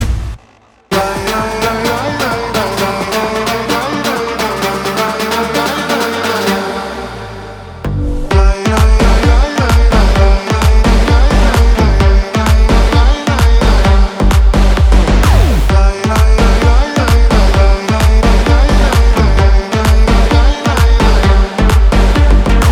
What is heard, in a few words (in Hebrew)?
רינגטון